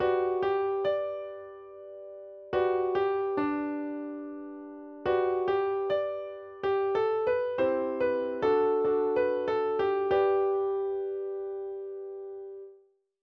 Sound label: instrumental